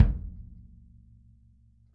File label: kick